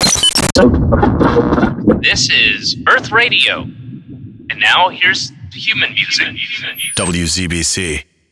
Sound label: Sweep Human Music; ZBC